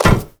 metal run

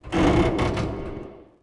metal strain

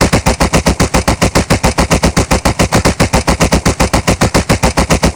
AGS17 R, Weapons; DCS

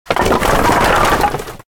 objects, World of Tanks

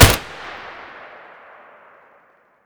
rsass fire distant; Escape from Tarkov

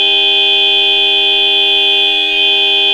horn dist; Modern Warfare

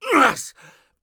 bear2 hit; Escape from Tarkov

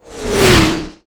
artillery incoming; Explosions

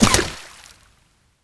water hit, damage; DCS

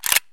mr133 pump in; Escape from Tarkov

